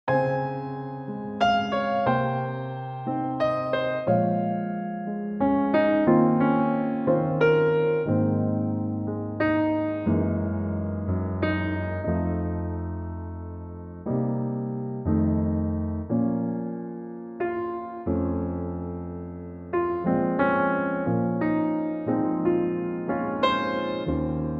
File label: piano Ab